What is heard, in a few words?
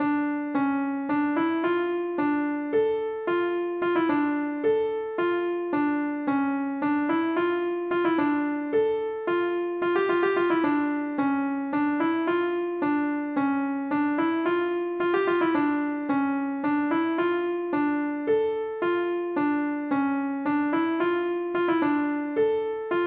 Fugue octave4